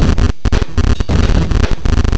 drums

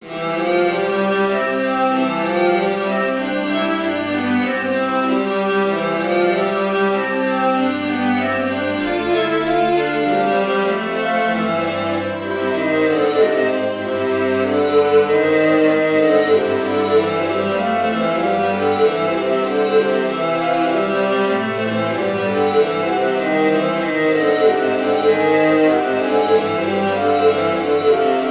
viola

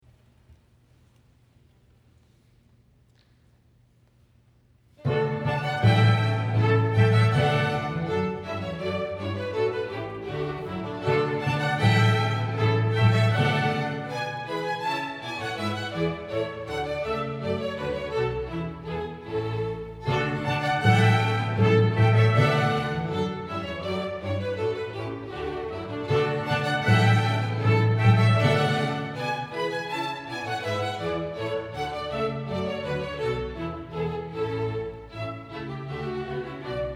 Gavotte

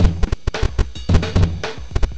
drums

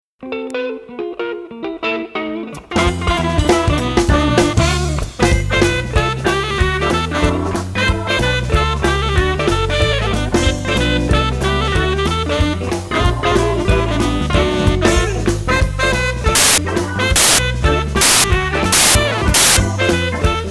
morse

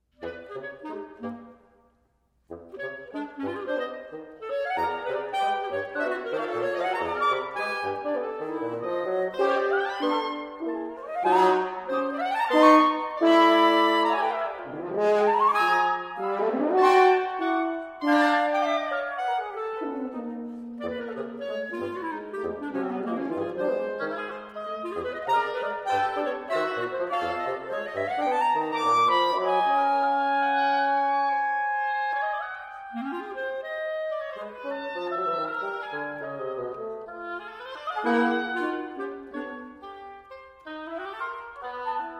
wind quintet

